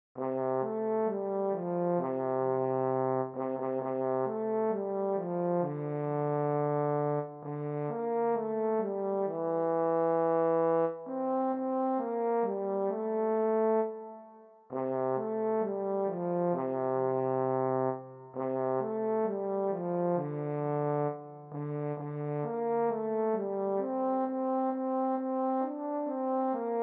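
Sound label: solo Horn; Jingle Bells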